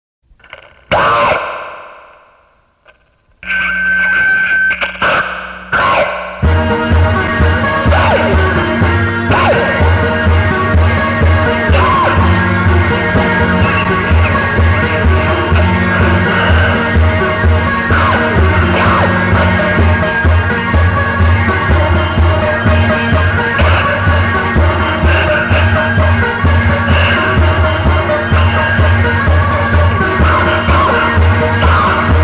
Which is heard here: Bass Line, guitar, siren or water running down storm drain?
guitar